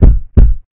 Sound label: heart Beat